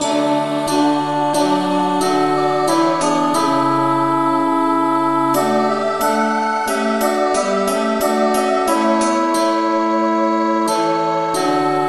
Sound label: choir, key D; SAINTTHOMAS